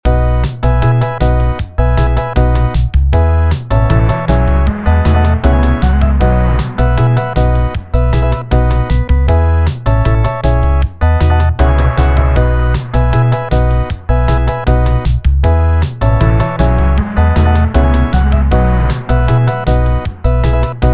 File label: e train chord